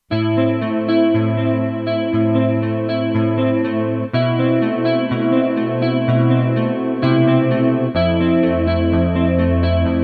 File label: Slo Chorus Gtr, Kurzweil K2500X; Factory Programs